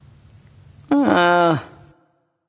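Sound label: ugh, female; smite; voicepack; hera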